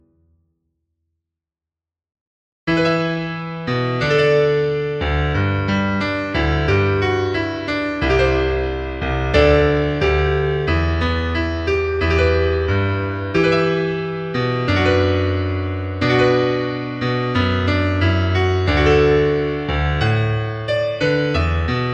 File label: piano